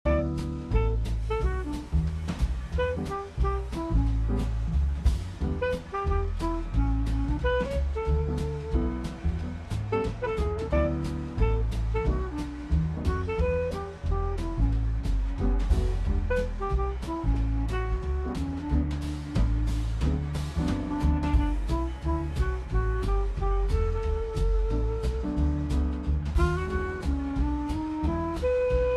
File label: music newyear